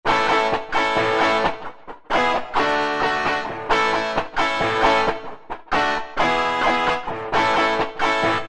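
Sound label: Thrash Lead guitar; E-mu PK-6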